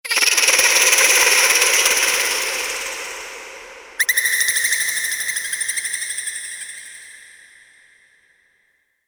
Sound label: fantasy creature